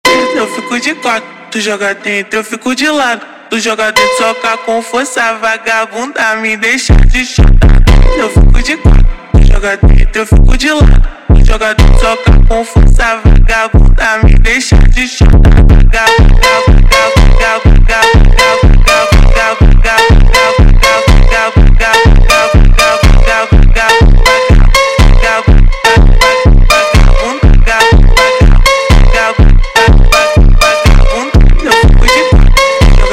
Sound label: Funk slowed